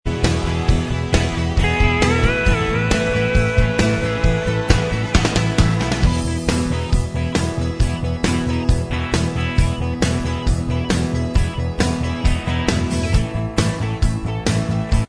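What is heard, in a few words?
instrumental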